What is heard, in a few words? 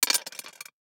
DICE on Glass, Throw and Roll, Standard, Two Dice; Samples; ArticulatedSounds; DICE Sfx Party (Extended)